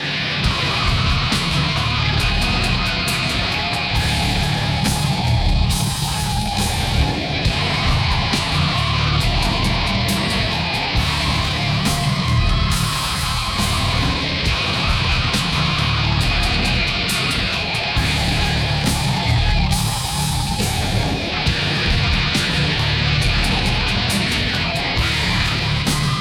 a slow ponderous death metal track with wailing guitars